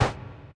mech armor land sand; Factorio

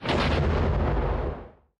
thruster engine activate; platform thruster; Factorio

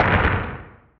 asteroid damage interstellar small; Factorio

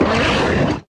stomper warcry big, enemies; Factorio

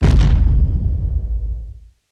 stomper stomp, enemies; Factorio

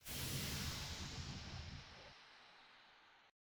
distant flames; factorio

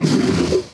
stomper death big, enemies; Factorio

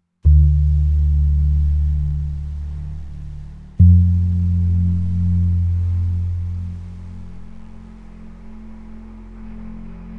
interlude, ambient; Factorio; gleba

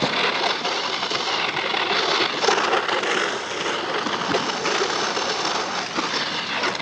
vehicle surface ice, driving; Factorio

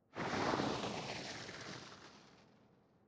sand wind gust; factorio

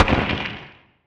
asteroid damage carbonic small; Factorio

